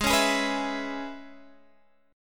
E 6th, G sharp

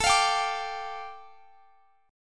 A 7th Suspended 4th Sharp 5th A, Guitar Standard; A7sus4#5; strummed; x,x,19,22,20,22; down; capo=9

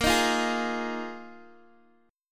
Bb 7th Suspended 4th, Guitar, Open G

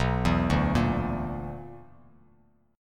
B-Minor Major 7th Double Flat 5th, Bass Standard; arpeggiated; JGuitar

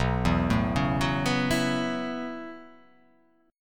B 7th Suspended 2nd Suspended 4th B down

